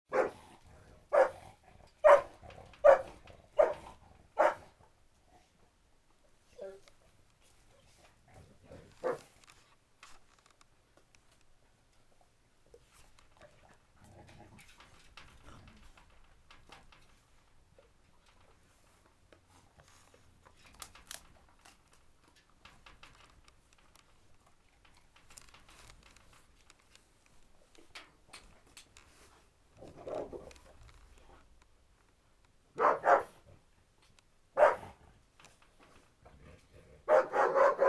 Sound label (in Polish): szczekanie pies groźny; Bony